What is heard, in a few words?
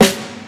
snare one shot a key, snare drum sound tuned to the A note. Loudest frequency: 1985Hz